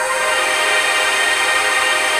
ATMOPAD; Best Service; XX Large; Pads

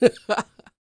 LAUGH

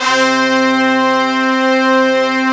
STAB

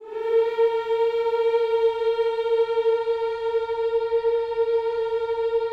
HMMS A#4, Gospel; Spectrasonics; Vocal Planet Vol.1 Gospel; akai

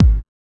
HSE-BD

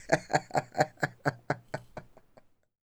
LAUGH

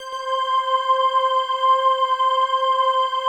pad, atmo pads; Best Service; XX Large

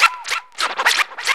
SCRAT; E-Lab; X Static Goldmine 2; akai

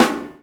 SD ROOM; E-Lab; X Static Goldmine 2; akai

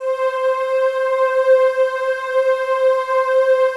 PAD; Best Service; XX Large; Pads

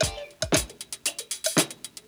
BREAK

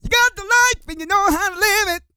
SING

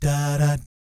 "DAH DAT", vocal jazz fx

